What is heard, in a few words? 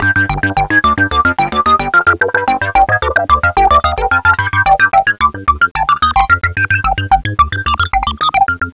Sequence 110 G; Sonic Foundry (Sony Creative Software); Crimson Blue and Fabulous Horncraft 4 RnB; SignalsII